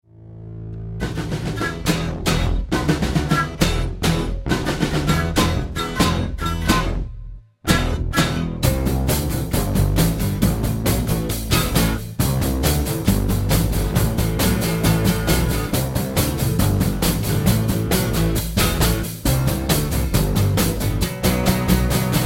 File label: Basses Drums Ac Flutes